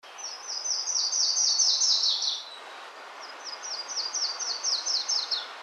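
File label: yellow throat warbler; calls; Audubon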